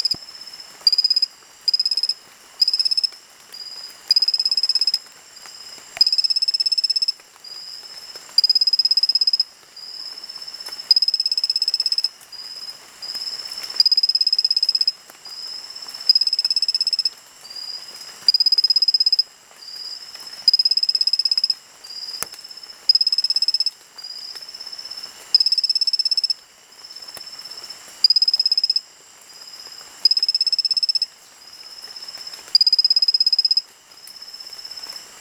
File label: Anomaloglossus baeobatrachus; ECourtois